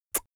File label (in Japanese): 口を離す音; 綾波系少女